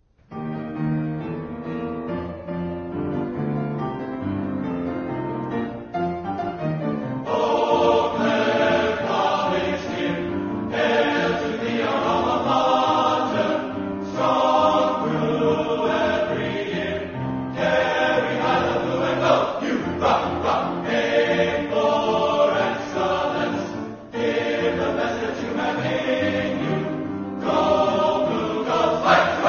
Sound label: Fight Song vocal; UW-Eau Claire; Blugold